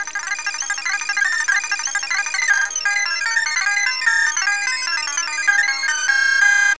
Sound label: END MUSIC